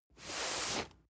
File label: cardboard box medium slide; objekte